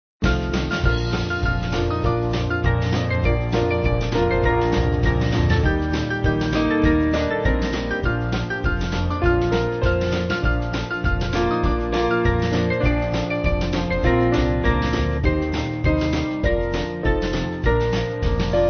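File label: Band, Music Backing; Battle Hymn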